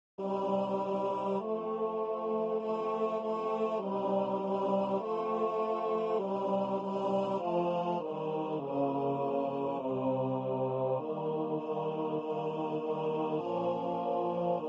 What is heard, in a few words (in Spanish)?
tracto barit